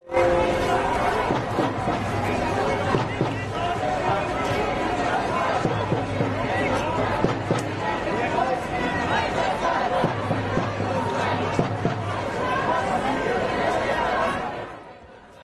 Crowd - Police Shooting - Crowds Leaving; MN Police Shooting

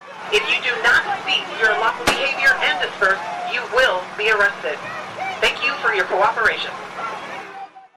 Crowd; Leave Or Be Arrested; MN Police Shooting

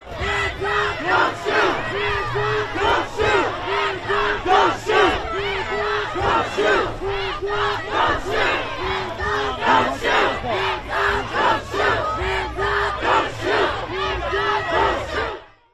Crowd - Police Shooting - Hands Up Don't Shoot; MN Police Shooting

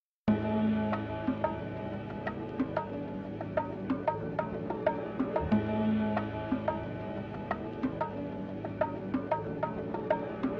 Instrumental Extended

